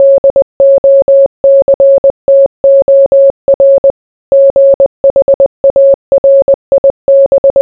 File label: morse